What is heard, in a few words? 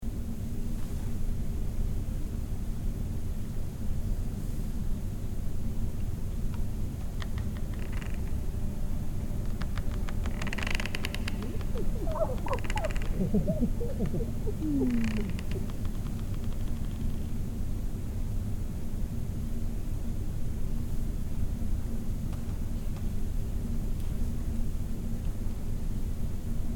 music Hotel Floor; Essex